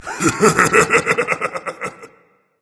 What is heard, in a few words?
laugh berserk; cuchulainn